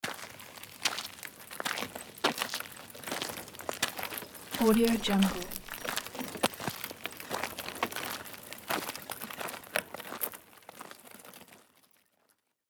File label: bicycle walking on forest path